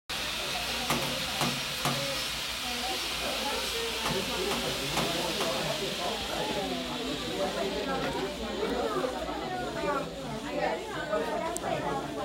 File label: filing hoof with hoof plane; Hoof trimming